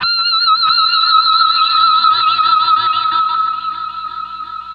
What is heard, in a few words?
E, Guitars; Total Drum&Bass; 90 s Sample CDs